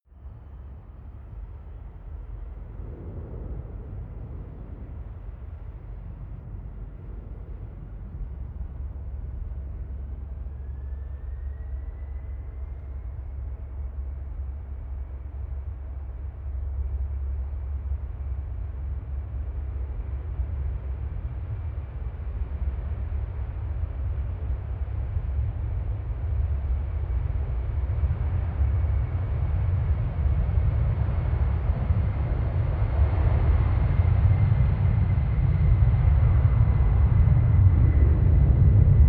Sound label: plane flyby land long; flugzeuge verkehrsflugzeuge; verkehr transport